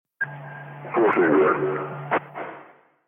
police; Flashpoint Ultimate 13; thelastnight

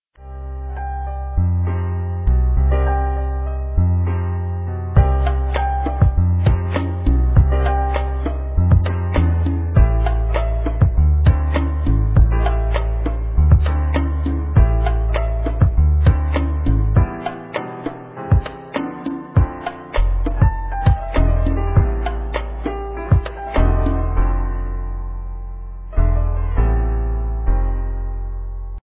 music; dropz

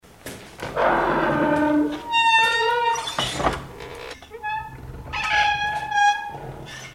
water gate; anno-1404

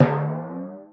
cartoon boing timp gliss

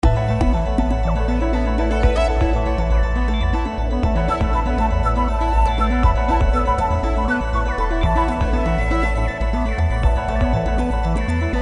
heart, bgm

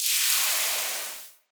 ice melt; CrossCode

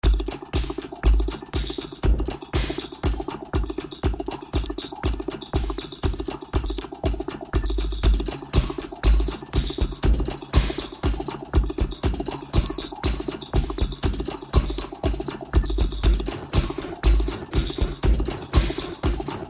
factory, music; vectorlight

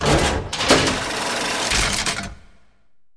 elevator; bodilies

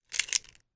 egg crunch; easter

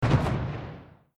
canon

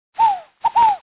bird; Puffer Popper

